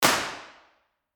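council chamber impulse response, York Guildhall council chamber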